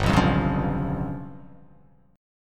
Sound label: G 7th Flat 5th